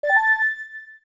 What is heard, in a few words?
Main Menu Select; Make a selection on the main menu